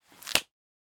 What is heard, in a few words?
closing book